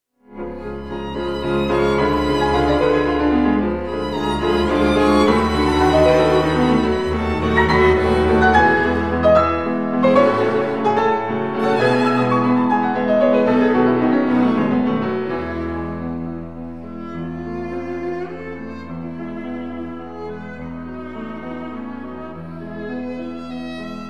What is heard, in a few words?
quintet no 2 in e major op 31 for string trio double bass piano